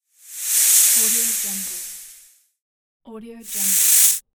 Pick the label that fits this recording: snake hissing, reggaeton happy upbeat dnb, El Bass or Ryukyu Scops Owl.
snake hissing